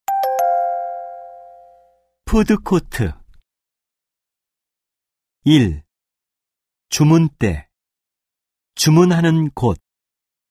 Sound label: K-POP